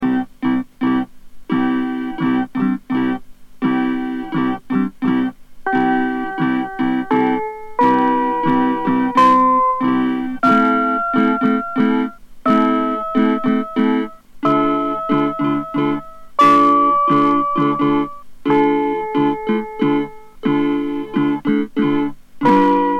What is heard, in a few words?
melody